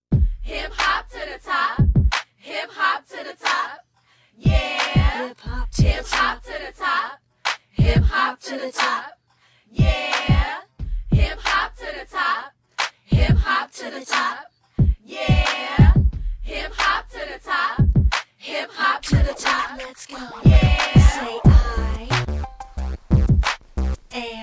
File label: Hip Hop